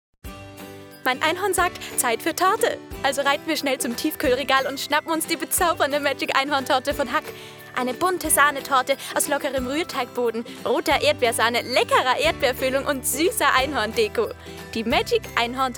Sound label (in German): Musik; Werbung Wellness Wagnersdorf